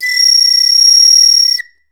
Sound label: World Whistles; Guatamala